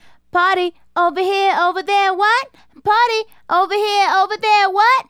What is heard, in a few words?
Female Solo, Hip-Hop and RnB Vocals